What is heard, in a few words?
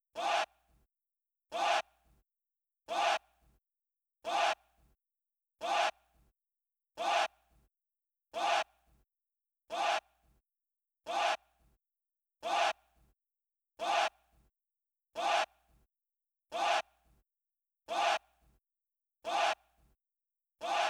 Vocal